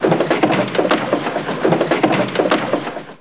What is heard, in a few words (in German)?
Muhle; Anno